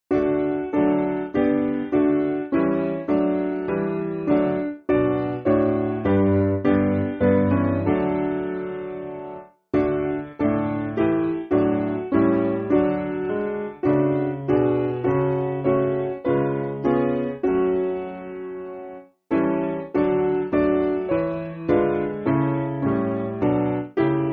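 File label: Piano, Music Backing Files for Churches; We Pray Thee Heavenly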